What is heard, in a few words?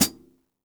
hihat - close; JazzFunkKit